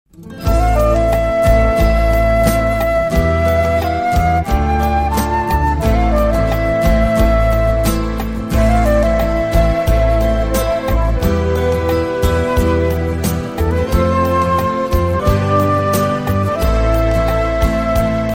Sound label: great melody ringtone; iphone 4s